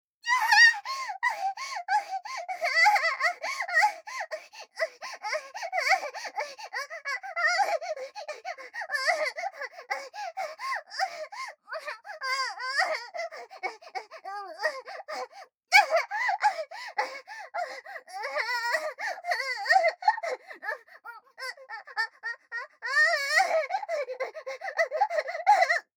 あげぎ声 激しく

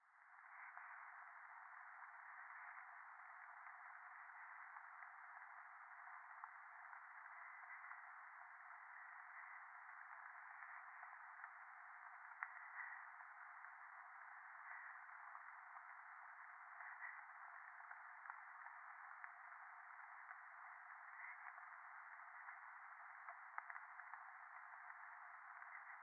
電話 左耳密着吐息 雨

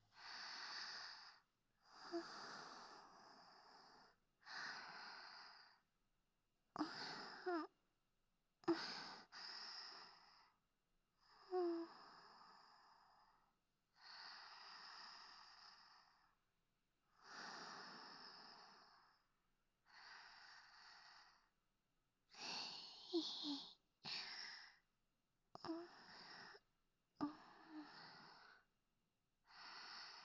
寝息; SEなし

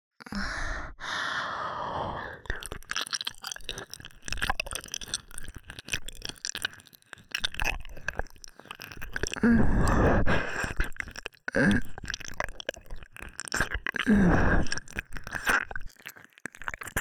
右耳舐め, ASMR

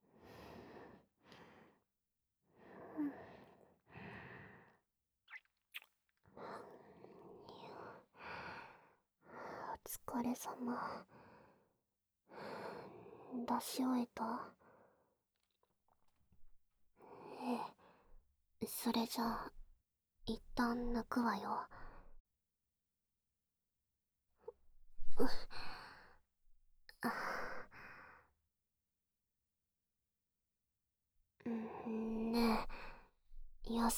耳舐め; 騎乗位耳舐め杭打ちレイプ

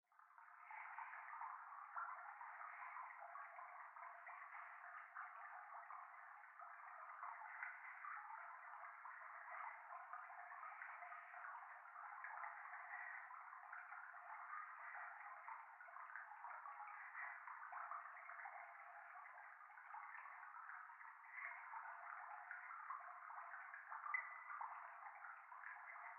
教会 左耳密着吐息 川のせせらぎ

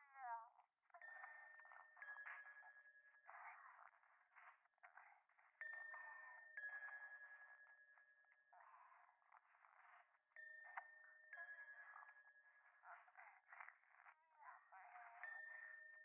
電話 フェラチオ 寺

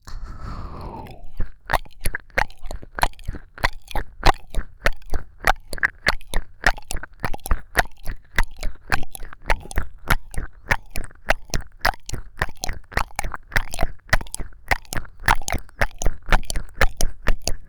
ピストン貫通コース

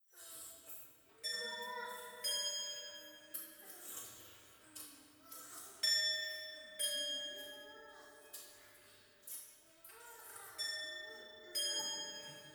トイレ イラマチオ 寺

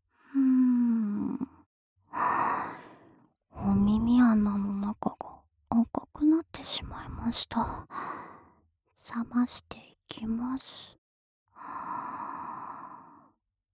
【右】耳ふー, ASMR

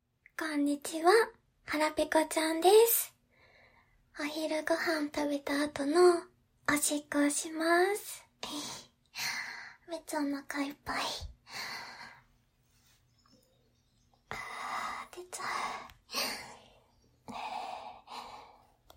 お昼ご飯の後のおトイレおしっこ; 昼食直後！; いっぱい出たッ！すっきり～！

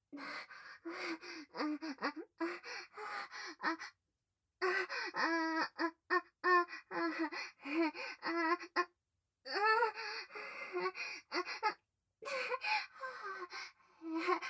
喘ぎ声 バック

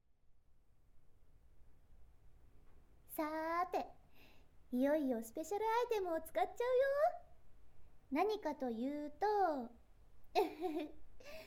炭酸シャンプーで頭皮マッサージ, ASMR

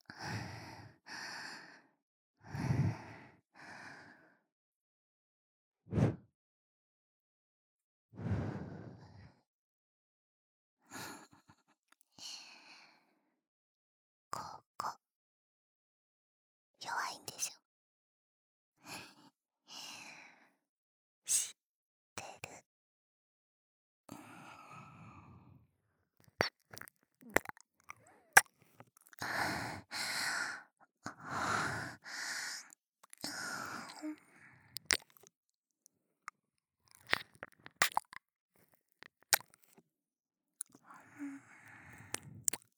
耳キス

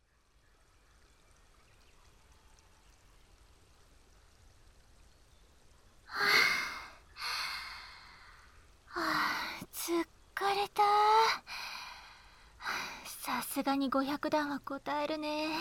早朝の神社と耳かき

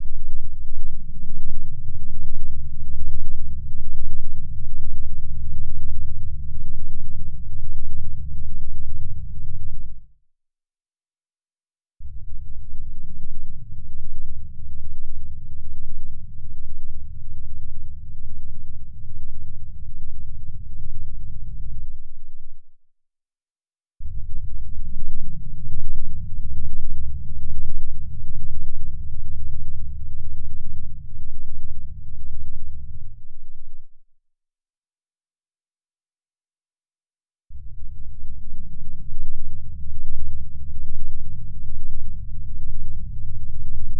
10Hz 脳内麻薬 低頻度活動周波数; 快楽矯正指示

